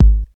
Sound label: strong bottom end kick sample g sharp key